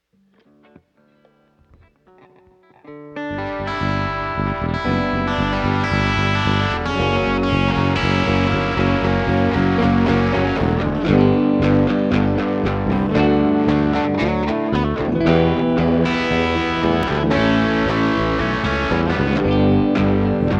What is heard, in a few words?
Strings